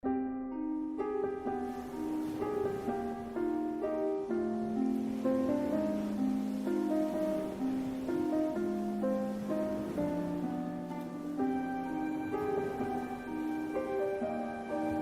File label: Orchestral Remix